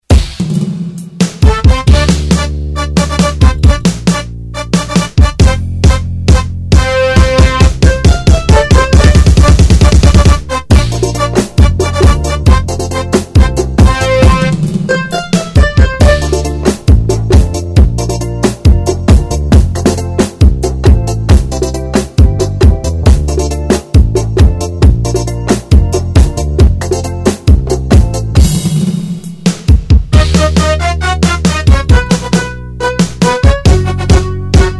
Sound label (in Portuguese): ritmos